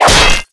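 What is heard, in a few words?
arrow impact robot; melee impacts; Garry's Mod; tf2; mvm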